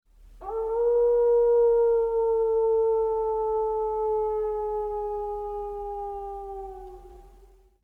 wolf howl; SPT Battle Ambience

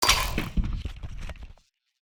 Quest Started; Custom UI Sounds; Call of Duty Modern Warfare (2019)

